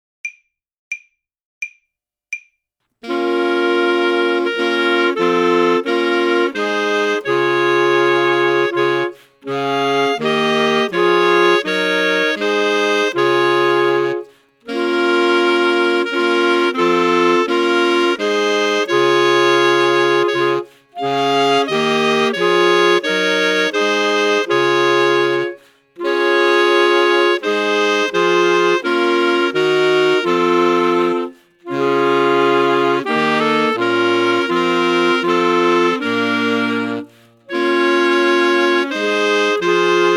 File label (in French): belle qui quatuor; saxophone